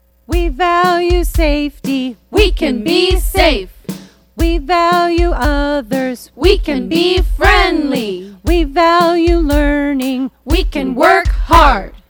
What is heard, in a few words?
values chant; Instill SEL